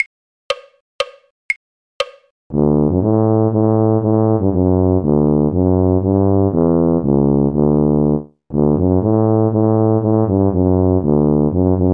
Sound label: tuba; carols